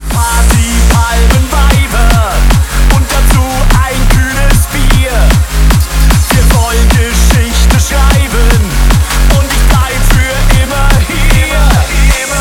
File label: Hardstyle Remix